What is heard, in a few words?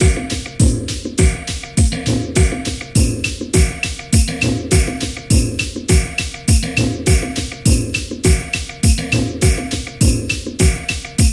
Tambura Instrumental Mix